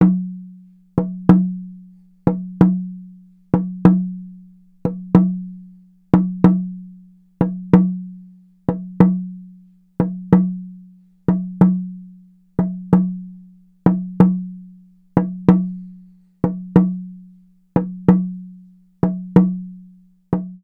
Shark drum; Stars (Tachenump)

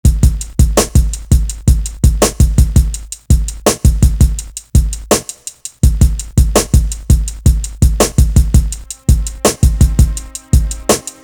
drumloop

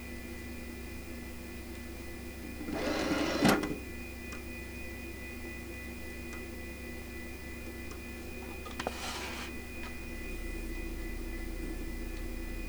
cd drive

